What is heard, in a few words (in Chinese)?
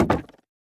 竹木栅栏门开关声